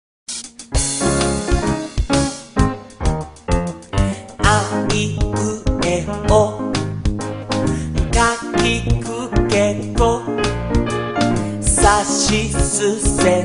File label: Japanese Alphabet Hiragana Song